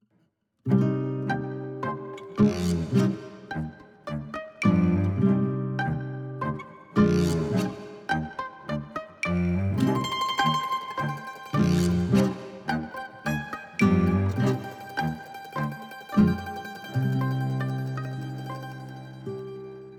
Tango

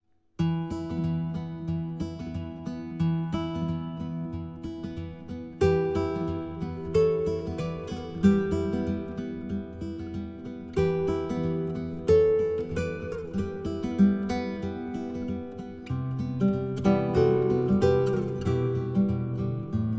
guitare

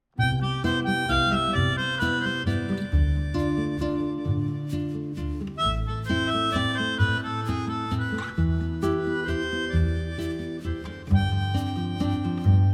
valse

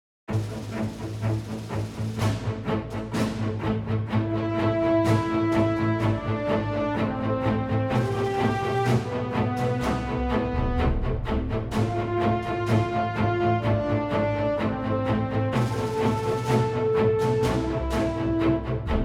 Classique; Guerre commence

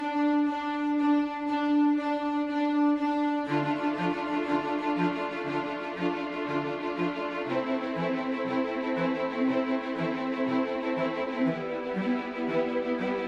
classique

